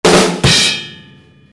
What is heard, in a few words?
CARTOON